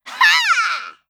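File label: attack, Voice Line, Korean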